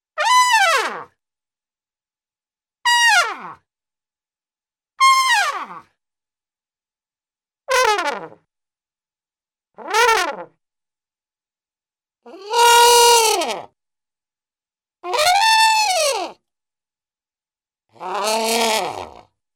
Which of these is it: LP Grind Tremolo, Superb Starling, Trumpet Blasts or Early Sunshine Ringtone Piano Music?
Trumpet Blasts